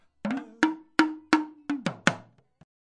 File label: drum; REMIXABLE COLLECTIONS